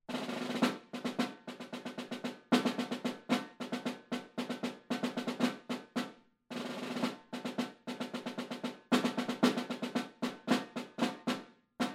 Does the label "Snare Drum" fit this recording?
Yes